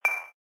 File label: notification